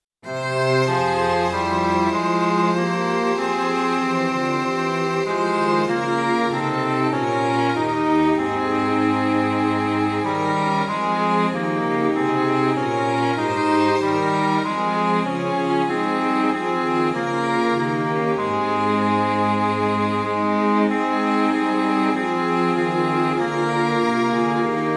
Fl Ob Eh Fg Vc, Tonality：f (♭♭♭♭) Tempo：Quarter note = 96; MIDI⇒MP3; Eh-Vc; Fl-Vc